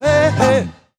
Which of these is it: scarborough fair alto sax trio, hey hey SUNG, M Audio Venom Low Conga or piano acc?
hey hey SUNG